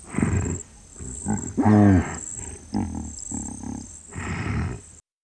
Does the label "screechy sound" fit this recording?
No